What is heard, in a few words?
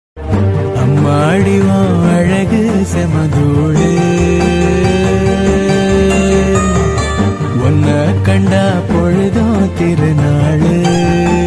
tamil love ringtone